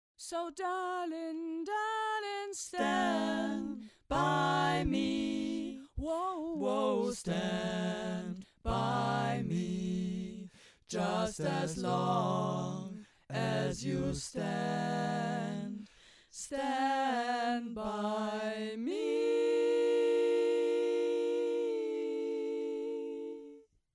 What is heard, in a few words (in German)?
alle Stimmen